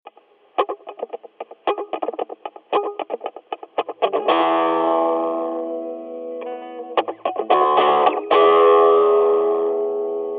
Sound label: E 112 GTR Riff Trashed